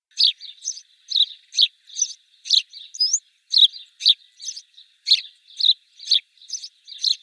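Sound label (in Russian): воробей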